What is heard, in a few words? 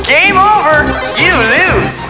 gameover; doom